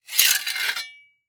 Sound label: Weapon Broad Sword Pick Up From Stone Floor; AdobeCC